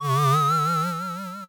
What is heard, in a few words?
8bit se